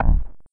thump

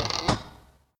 Door Shut

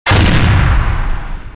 missile hit

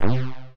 Menu Dis Confirm

